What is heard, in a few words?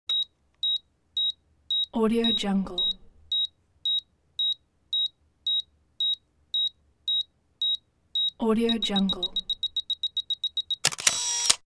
camera timer and shutter; almira23